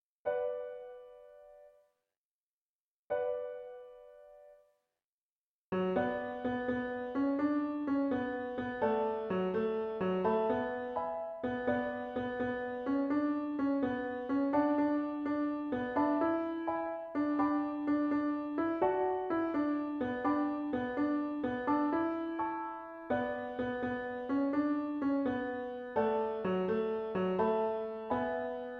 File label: Bass